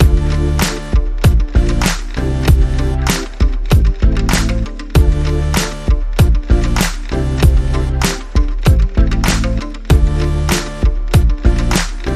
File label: Out Beat